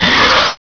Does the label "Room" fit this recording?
No